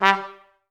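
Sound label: staccato G2; Best Service; Peter Siedlaczek's Advanced Orchestra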